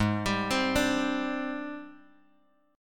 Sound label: G sharp Major Sharp 11th, Bass Standard; G#M#11 arpeggiated; 16,22,22,19; JGuitar